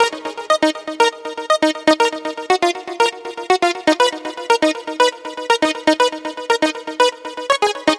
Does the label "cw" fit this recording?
No